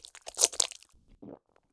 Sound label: eat